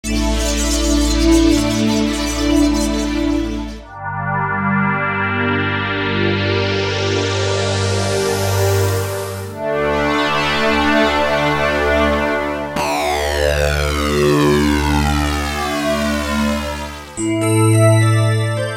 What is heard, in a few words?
Synth Explosion